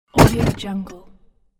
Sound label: placing heavy toolbox on soft surface; industrial sounds